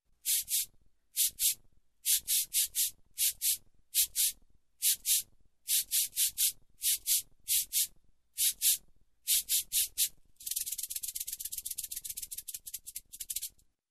Cabasa

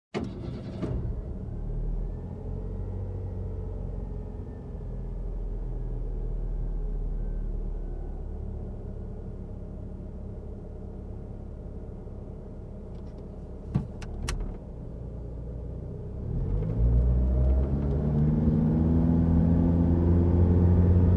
Car short drive

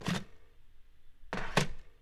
Phone Pick Up Hang Up

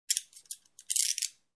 Handcuffs clicking and being locked

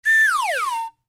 Funny cartoon flipping sound effect

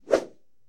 Slow punch swing

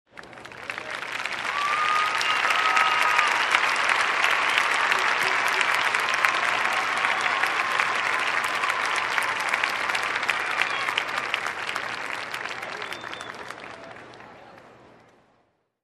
Astonished Crowd Applause and Cheers

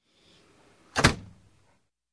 Car Door Close

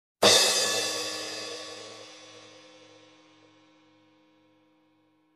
Large single crash hit

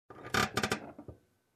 Pulling handbrake

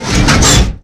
Metal Tear Scrape

